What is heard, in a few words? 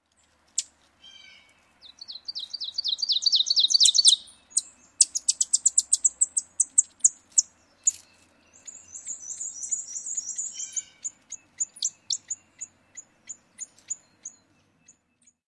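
song and interaction calls, ovenbird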